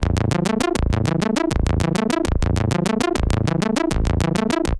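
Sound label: SYNTH, TIMBA, Hip-Hop & R&B; USB Soundscan vol.47; AKAI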